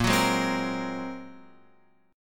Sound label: Bb 7th Suspended 2nd Sharp 5th Bb down Guitar Open F; Bb7sus2#5 strummed; JGuitar